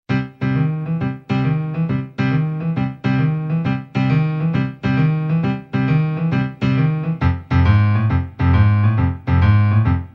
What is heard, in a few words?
blues music